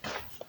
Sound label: foot steps; Walking; fmod-moloch